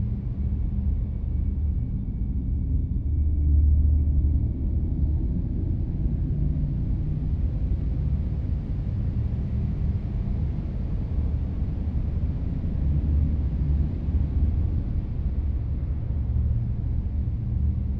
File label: habitat idle; aquatics